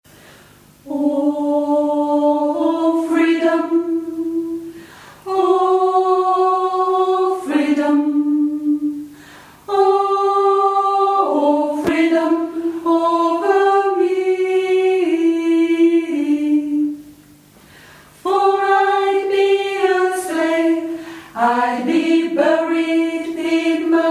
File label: Alto